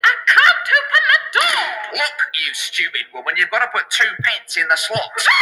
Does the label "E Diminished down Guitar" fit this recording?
No